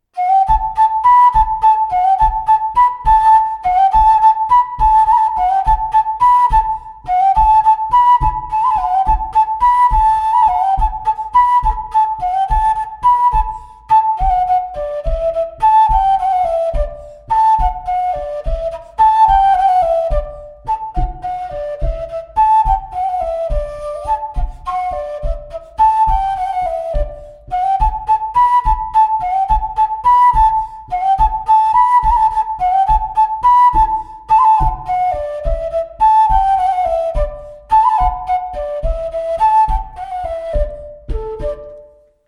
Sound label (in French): Montagnarde; Recueil De Raoulx